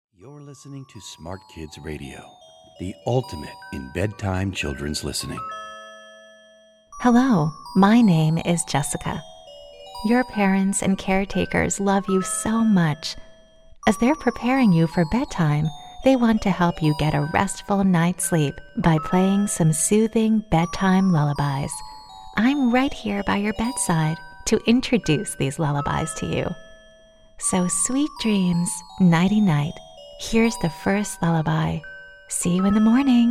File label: Bedtime Lullaby